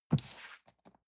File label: cardboard box medium grab slide soft; objekte